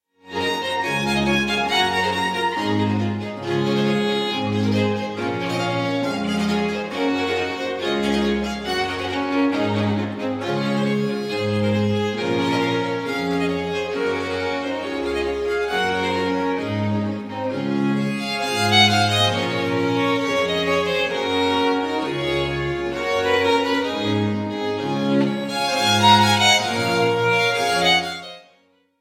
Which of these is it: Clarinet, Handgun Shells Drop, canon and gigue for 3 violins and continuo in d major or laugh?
canon and gigue for 3 violins and continuo in d major